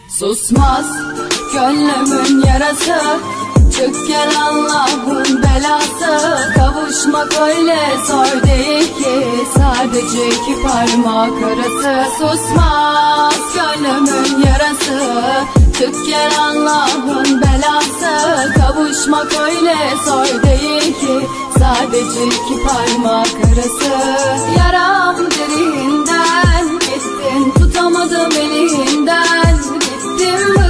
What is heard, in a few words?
Ringtone Mobile